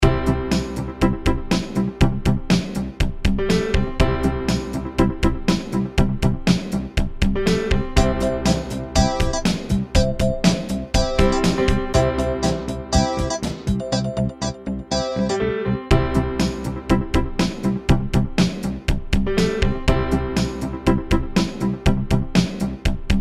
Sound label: Toy Keys